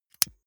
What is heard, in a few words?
lighter electric switch flame on gas